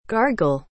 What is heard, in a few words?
gargle